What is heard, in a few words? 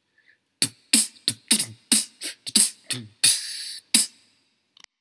tom